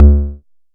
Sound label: analog tonal, Kickbank